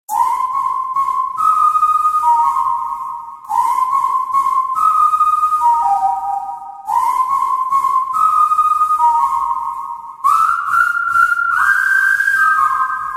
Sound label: Whistle